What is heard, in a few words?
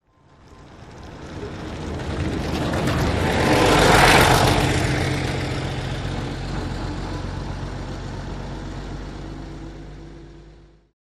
Bus By Coach Drive Bye